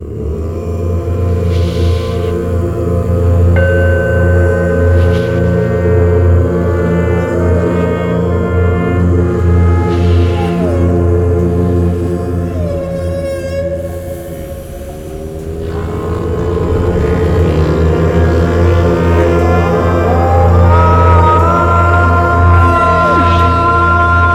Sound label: Gamma Waves